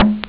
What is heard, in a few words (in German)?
klopfen; Amiga Soundtracker sample